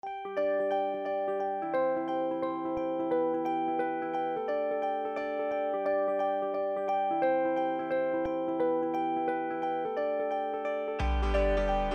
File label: Instrumental, 175BPM; Double Rainboom